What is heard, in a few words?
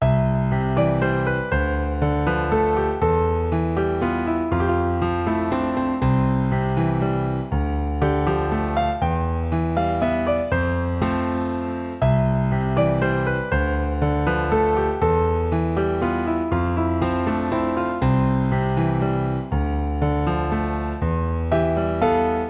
Calm down gentle piano